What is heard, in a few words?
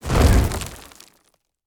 Rock